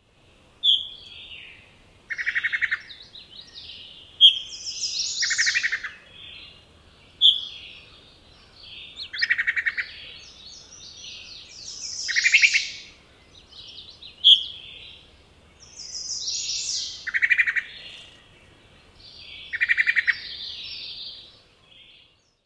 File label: wood thrush eek calls bup volleys NY